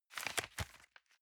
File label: envelope swap medium